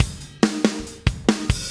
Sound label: 140 Groovy Fast Drums, Very Fast Tempo; Pop Rock; Pro Tools Essential Loops; Digidesign